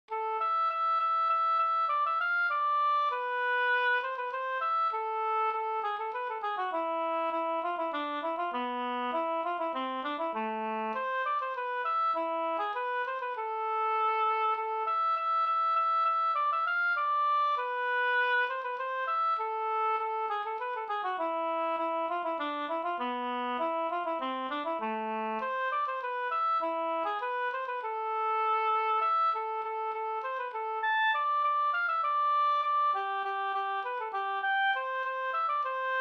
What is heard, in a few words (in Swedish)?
polska Lillpolskan